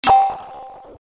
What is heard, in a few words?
Wavecycle Distortion, single marimba stroke; Multiplication of wavecycles; DISTORT MULTIPLY; Repetition of wavecycles; CDP Functions: DISTORT MULTIPLY and DISTORT REPEAT; The DISTORT functions manipulate 'wave